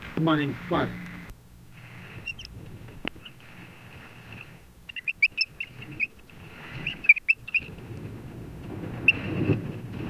calmer more relaxed homin, human

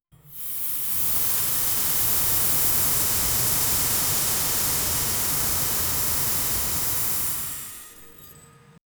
cinematic asmr soundscape

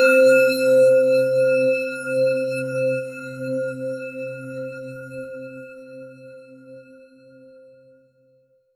golden spark chime victor

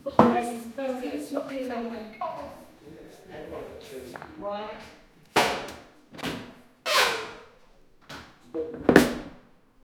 russian school classroom

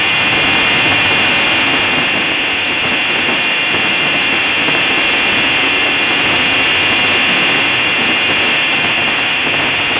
energetic creature cry, digital static

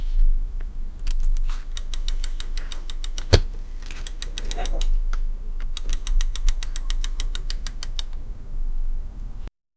attaching the new seat of, screwing

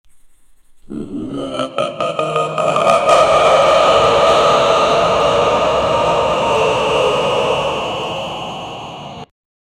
atrocious sounds of an ev

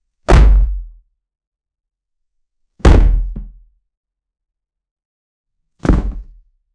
body falling from height, body fall

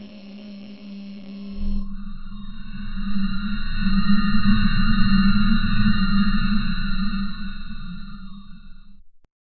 metallic gears whirri

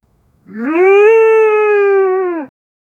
deer fawn voice; animal call

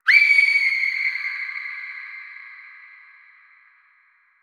snowball hits giggles; winter

